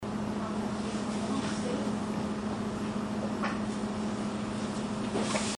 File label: laugh recorder bathroom area; Gardner’s Used Books & Music; Tulsa, Oklahoma